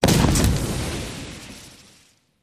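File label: Explosion Wood Debris; wood & sand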